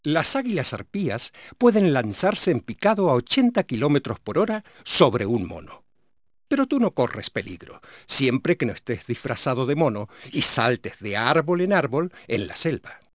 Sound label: HARP; MS Animales